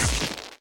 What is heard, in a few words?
spark; Mindustry